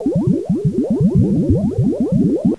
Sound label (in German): Klo